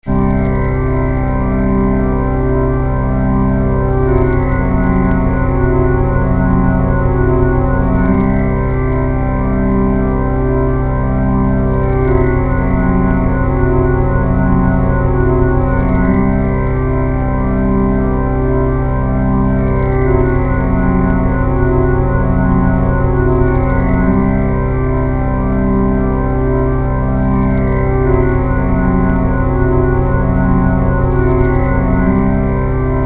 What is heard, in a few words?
Mystic Horror; Serious Sam The First Encounter 2001; game soundtrack; Cut Scenes